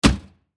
Fire Truck Compartment, Fire Truck Compartment Close; Emergency; Emergency Vehicle Sound Effects